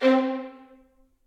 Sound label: Violin Section Staccato; Ableton; Orchestral Strings